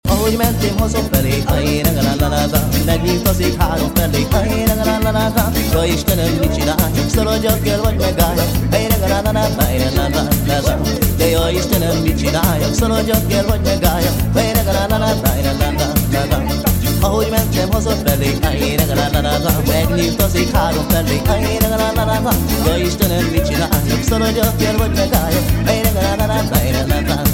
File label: Trio